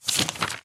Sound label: tile flip